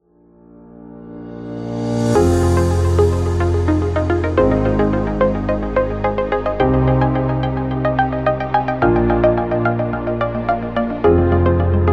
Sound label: Interview Music